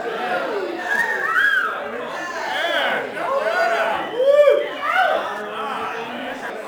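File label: Boxing Crowd Small Chatter Loop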